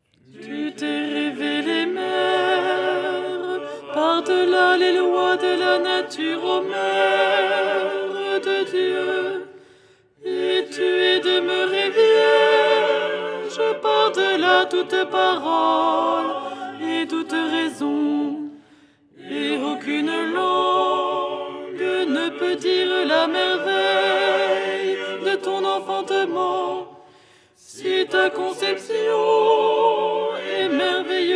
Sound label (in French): alto; Théotokion dogmatique; Les 8 tons en français